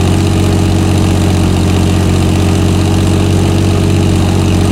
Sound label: comet engine rpm 66 load, engines tanks; War Thunder